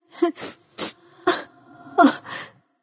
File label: boohoo; smite; aphrodite